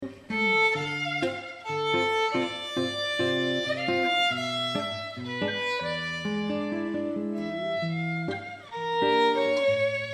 duo violin guitar bossa nova

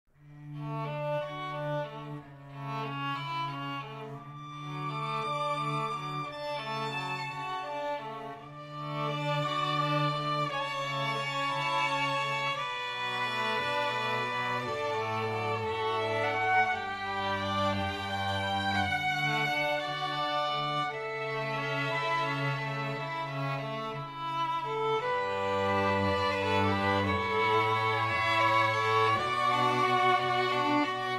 Quartet

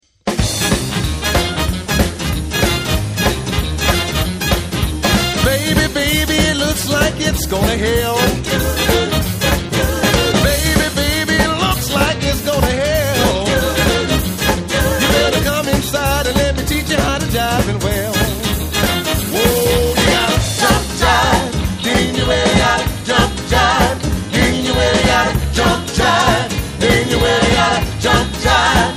Jump, Double Bass

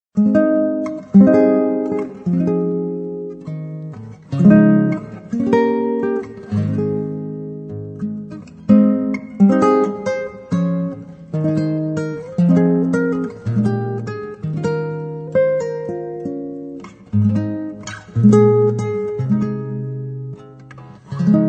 solo guitar bridal chorus; wagner bridal chorus from lohengrin

